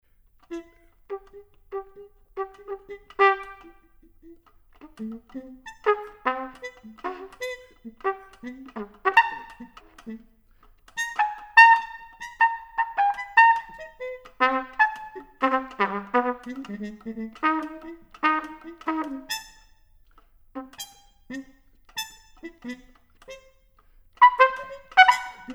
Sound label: Improvisation